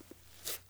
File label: zombie footstep; Project Zomboid v41.73